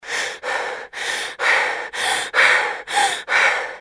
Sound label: woman breath; ringtones